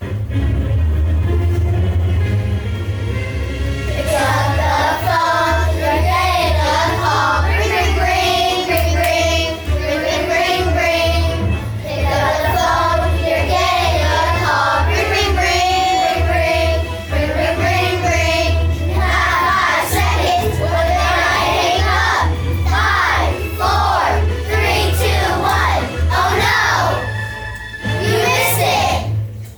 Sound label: ringtone